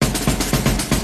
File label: Gameplay Transition; bailout wars